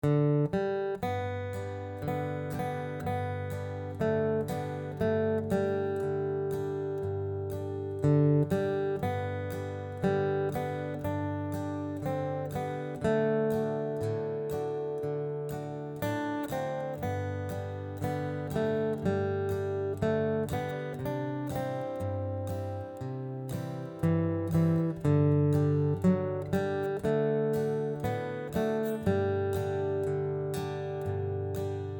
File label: Red River Valley GUITAR